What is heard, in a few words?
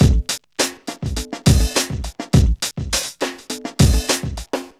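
103 bpm, Funky loops; Zero-G; Funky Elements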